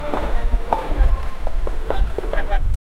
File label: medieval town merchants s, bustling